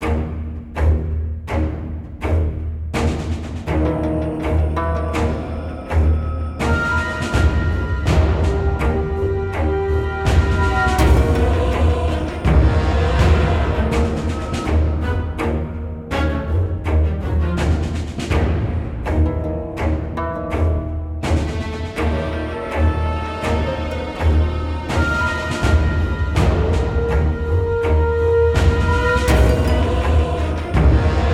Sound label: music boss; pale king; Immortal Darkness Curse of the Pale King